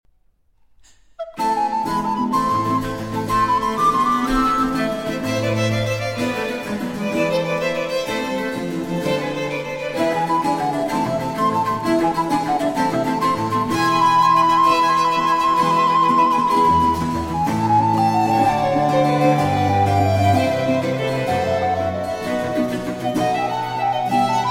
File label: Concerto For Recorder, 2 Violins & Continuo in A Minor, Allegro